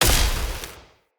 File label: Cannon Tesla Shot; Weapons; Player